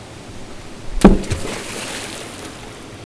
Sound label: Waterfall Throwing rock into water hits bottom